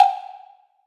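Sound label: WOOD BLOC, drum machine; Drum Mashines; EdgeSounds; JD DRUMS KIT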